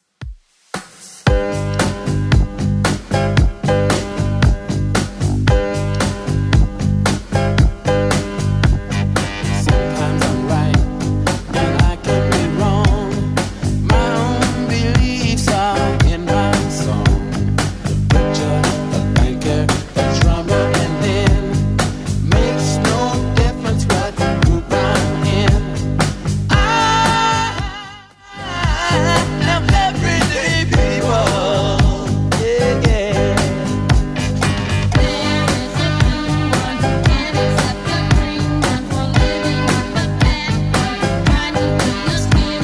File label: 60s Dance Redrum 114bpm